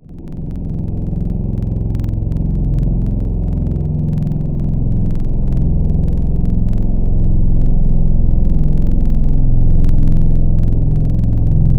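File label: strings Cello, Wavetables; Synthetic's Serum Collection; Standalone-Music SYMPHONY Wavetables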